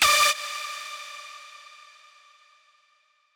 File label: lead